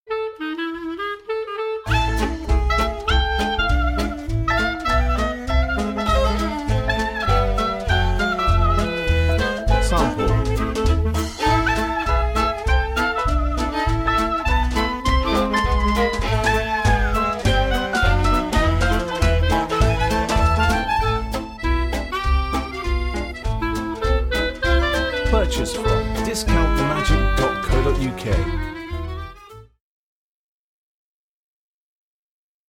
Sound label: beat